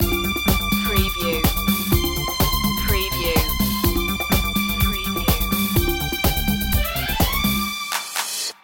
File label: disco loop